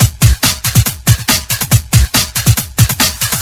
Breakbeat 140 BPM; Loops; vengeance essential club sounds-3; VEC3 Breakbeats

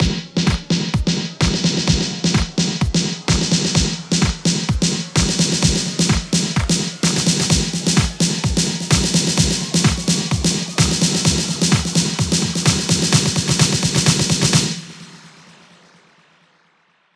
128BPM NuStyle, House Electro Fills; 8Bars; Vengeance Samples

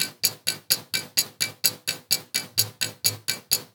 VFX2 Loop 128 BPM

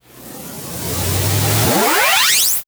Uplifter

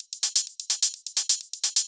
Loop, 128 BPM; Vengeance Samples; Vocal-Hook Kits; Song Kit

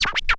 Cuts, Vinyl Record Samples

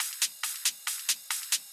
138BPM Loop; Drums & Perc; F major; Vengeance Samples; Another Day Kit

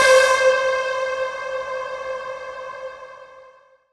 Synths C; Vengeance Samples

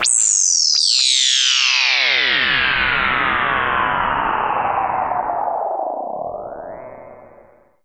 Downsweeps

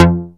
Synth Cuts; Vengeance Samples; VES1 Instruments

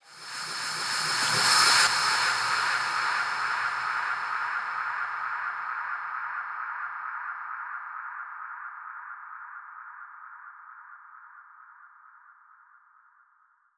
132BPM Reverse FX, G#major; Sound FX

